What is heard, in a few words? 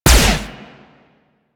Flux Rifle Lens Shutter; Crp Laser Rifle; BE Flux Rifle